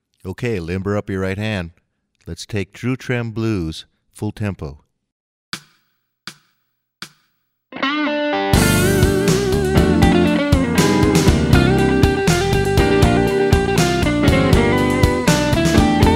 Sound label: True Trem Blues